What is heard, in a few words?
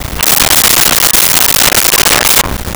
LoFi Synthetic Squawk; SONY PICTURES SOUND EFFECTS SERIES